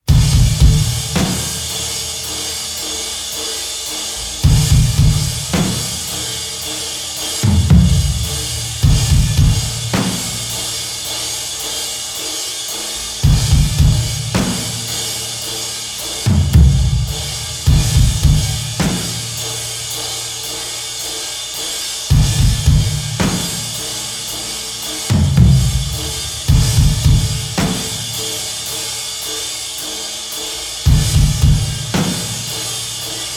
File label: noise rock